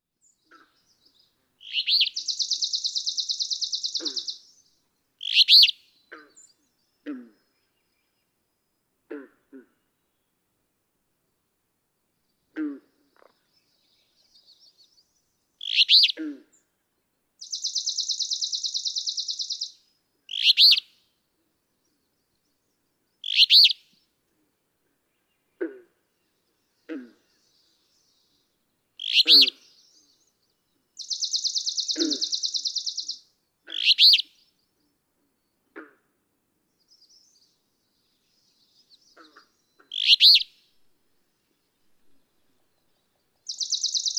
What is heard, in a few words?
Alder Flycatcher